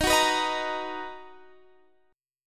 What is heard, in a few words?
B Major 7th Eb